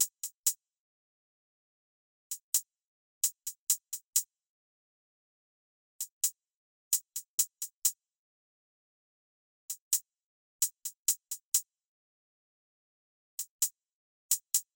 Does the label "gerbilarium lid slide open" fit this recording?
No